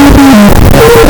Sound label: Timpanis; lolicore